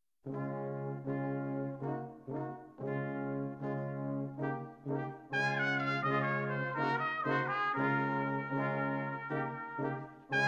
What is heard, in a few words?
Brass; 3+3+4